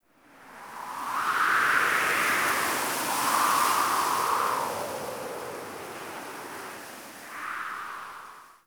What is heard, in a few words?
Wind Gust, Camp; Quests; The New War; Ambience; Drifter Camp